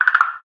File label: Wood, notifications; Infinix XOS 12